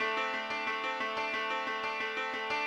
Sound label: ETHNO 90 D